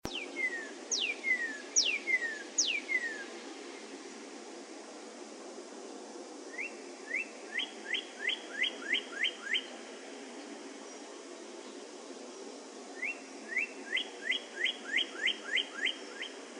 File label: cardinal morning bird